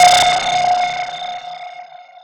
Lead, Club Techno; 90s Sample CDs

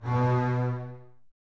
BSS, Basses, String Ensembles; Miroslav Vitous; LINKS ORCH

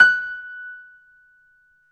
PIA MICRO, MICRO-PIANO; Sound & Vision - Gigapack II

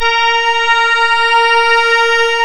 ORGAN, A#; Big Fish Audio; Synclav FM

